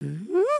big love, emotes

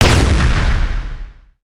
pirate cannon fire

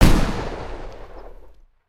Charge fire

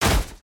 Pirate Death Impact; foley

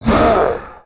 Troll Groan

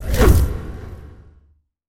torch by oneshot

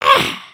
headspin, emotes

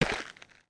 Foot Dirt Sneaker Walk, footsteps

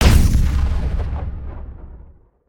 launcher fire

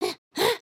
sneeze

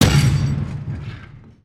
Impact; Anchors Away

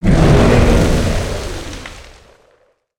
Fire Breathing; creatures organic

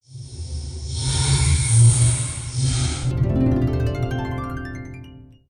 Pet Cat Give Egg Drone; creatures pets

